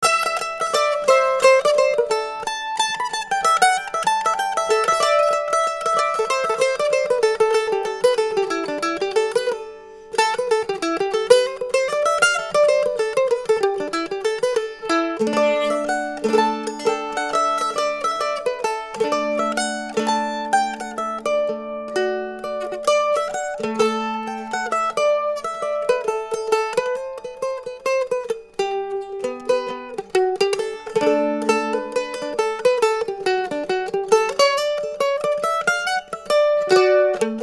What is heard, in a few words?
c f martin co a style